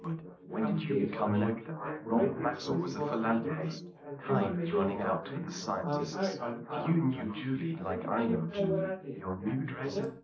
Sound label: male, 380ms 4cm; SiSEC11; underdetermined